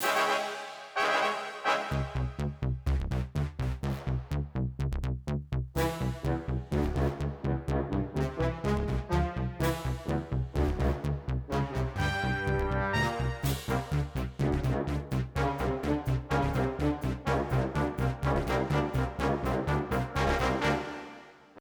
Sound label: Boss Low Part; Plants vs. Zombies Battle for Neighborville; DreadWood Quest2 Squash